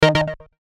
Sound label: Synth Stab Delay; Stab, Bright, Hit; Multimedia; Delay, Production Element, Imaging Element, Accent, Transition; Stabs; Blastwave